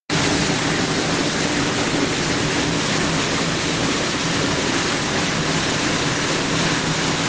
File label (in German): Wasserfall